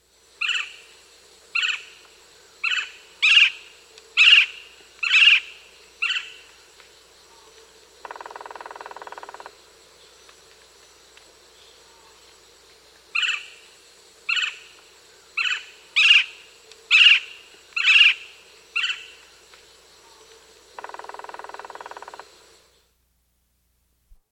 Black-cheeked Woodpecker, Nature's Songs, S. America